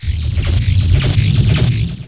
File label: flash charge